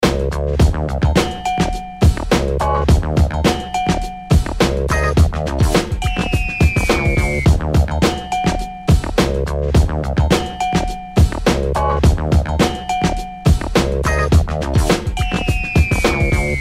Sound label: Break